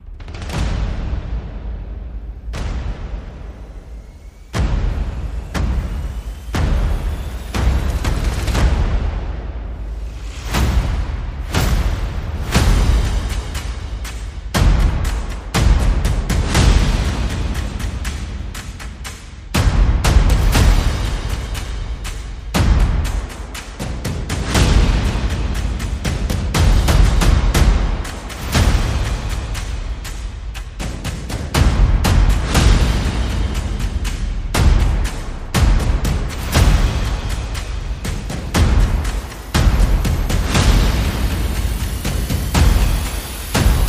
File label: Percussion and Effects